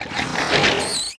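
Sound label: Skeleton Archer die; Seer; GamigoNA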